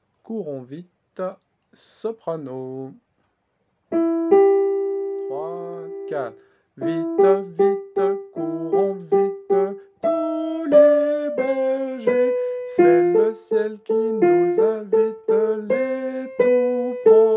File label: soprano